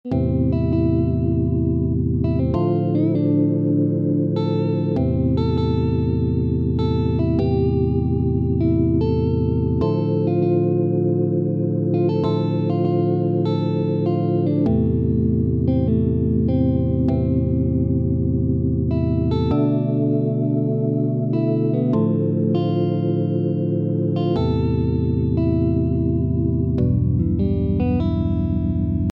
Easy Solo with Chord notes

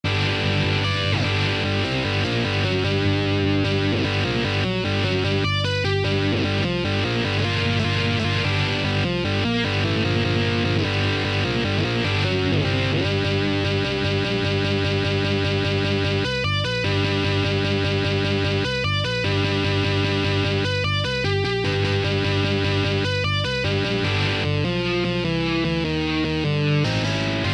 Easy Slide Guitar Solo

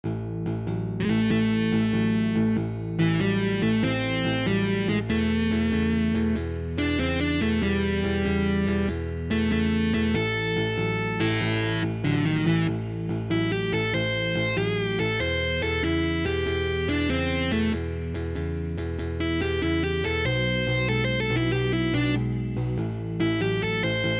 Easy Blues Solo in A

